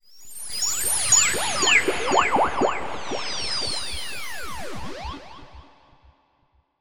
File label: sound design fm delayed bright